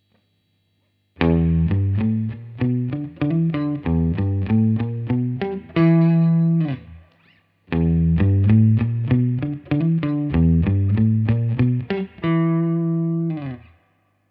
Carl Martin Tone Tweaker More bass

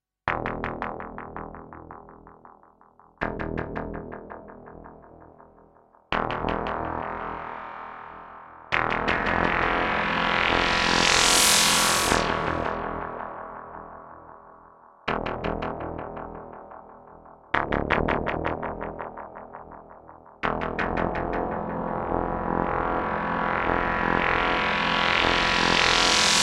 Shaper Sinus Double FM; Mayer MD900

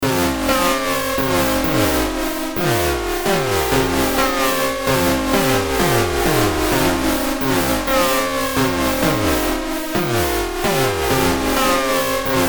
OP-X Soft Perc

